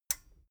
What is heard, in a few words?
metal button stereo release disable